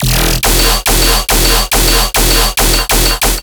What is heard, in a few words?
Bass Loop E 140BPM, Dubstep; Moonbows Bass; Ghosthack - AC2024 - Day 13 - Dubstep Sounds 2025